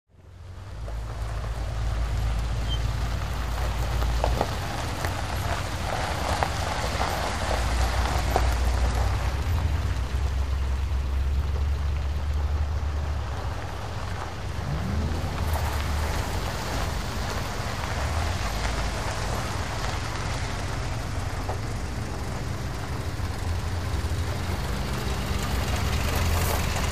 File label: Car Close Slow Gravel